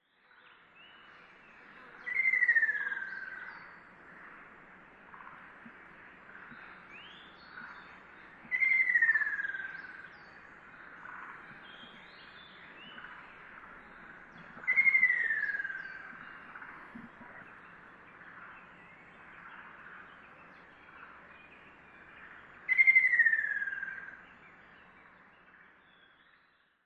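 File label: Ruddy Kingfisher; akashobin; koe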